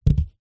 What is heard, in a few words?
foley foam board set down on hard surface; zapsplat